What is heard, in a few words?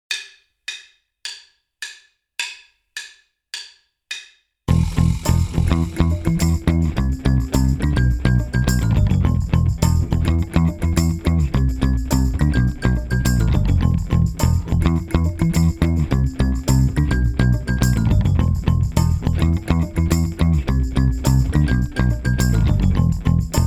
Ghost Groove drumless